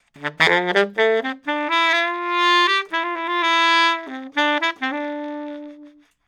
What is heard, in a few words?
SAX RIFFS BARITONE 124 Dm